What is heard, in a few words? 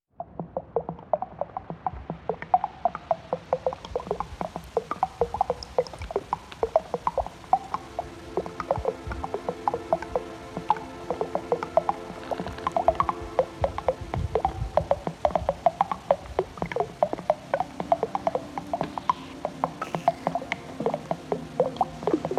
Bubbles; Overlook